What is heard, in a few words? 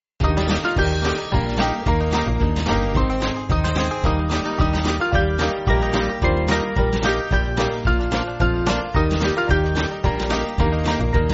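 Band, Backing Files for Churches; Sankey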